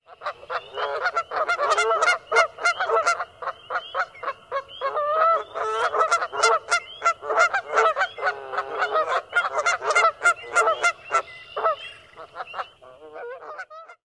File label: pair cavorting near nest